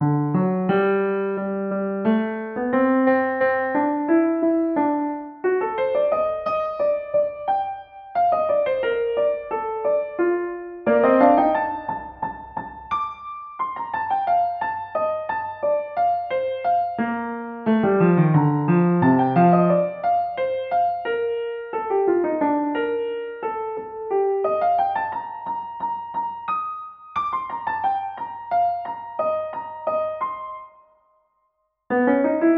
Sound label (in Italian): sonata in mi maggiore per pianoforte